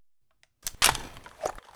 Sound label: Inf Weapons Foley; Red Orchestra Ostfront 41-45